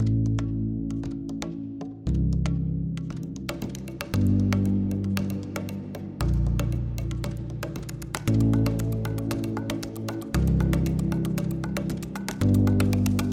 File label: tbilat drum; soundiron; kontakt